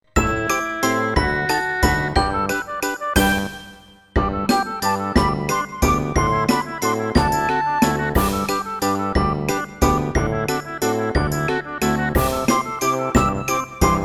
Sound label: Toad's Waltz